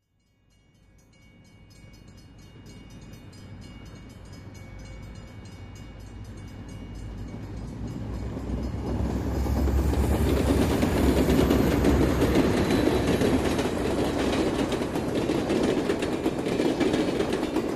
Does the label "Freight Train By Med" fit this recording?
Yes